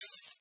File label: coin; Ninja-Action-2